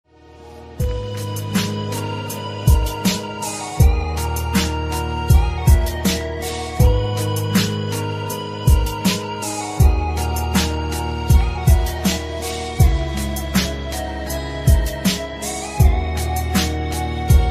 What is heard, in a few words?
Bass